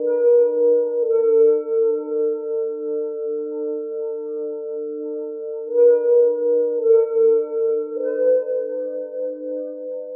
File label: alien abduction, Electronic Loops